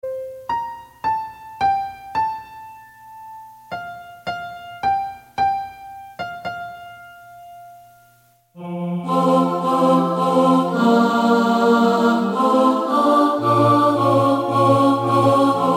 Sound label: TENOR